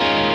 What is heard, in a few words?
6 6 6 6 6 Db6add9